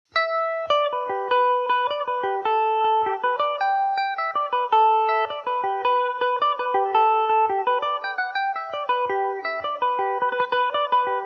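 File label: jig G major revelation, irish mandolin; Travers’ Jig; Revelation Jaguar electric mandolin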